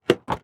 Truck GM Envoy Open Trunk; transportation

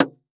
Impact Milk Carton Plastic Hit By Plastic Tube

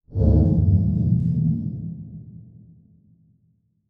Transition Whoosh Fire; Production Element; Title Transition; AdobeCC

